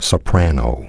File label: soprano